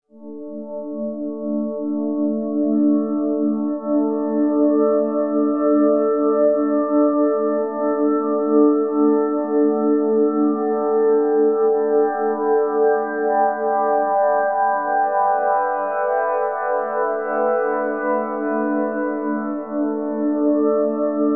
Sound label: HARMONIC PAD; Bolder Sounds - Granular